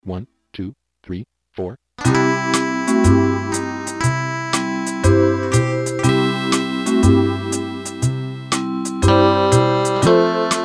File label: HAWAIIAN, Yamaha PSR-300; Styles